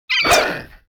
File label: attack